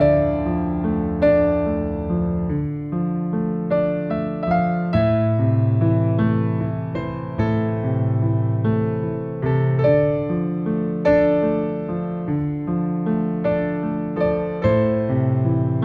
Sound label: Piano